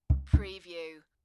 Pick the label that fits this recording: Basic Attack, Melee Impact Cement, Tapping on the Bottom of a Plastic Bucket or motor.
Tapping on the Bottom of a Plastic Bucket